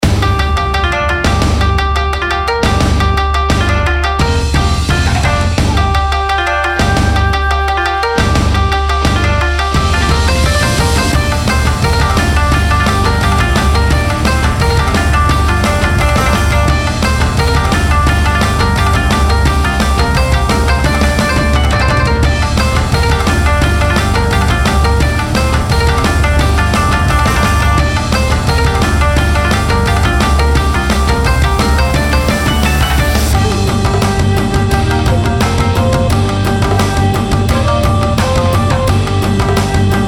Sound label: Raid Boss; mist train girls android ios mobile gamerip 2020